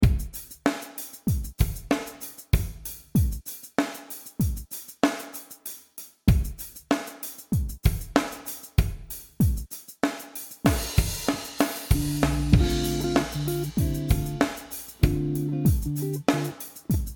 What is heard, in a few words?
Dm96 Bluesy Groove in Five Four